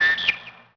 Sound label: amb bird; Tony Hawk's Project 8; XBOX